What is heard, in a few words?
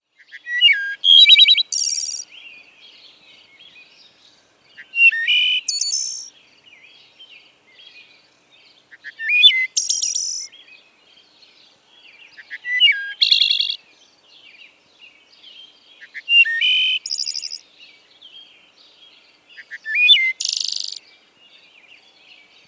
Wood Thrush Song